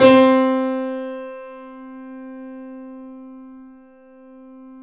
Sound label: The Piano C; InVision Interactive - The Piano